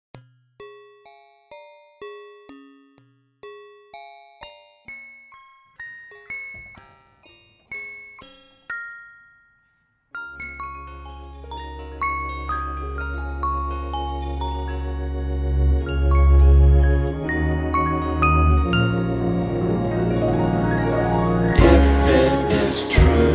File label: creative time jingle